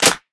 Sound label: raw clap sound E key; single hits one shots